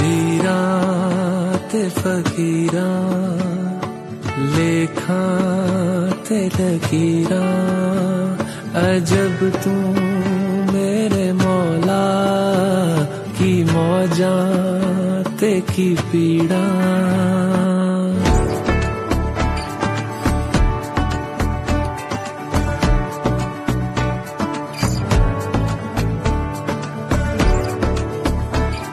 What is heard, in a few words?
Ringtone